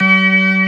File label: B3 G#2, ORGAN; VOCAL ORGAN; ORGAN #1